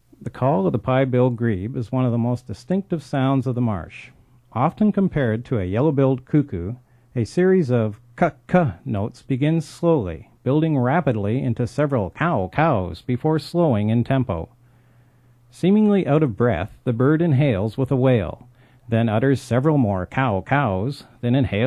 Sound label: Pied-billed Grebe